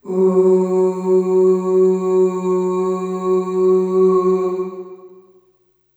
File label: Ooh Long F#2 Short Reverb; Harmonic Building Blocks